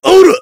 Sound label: heavy meleeing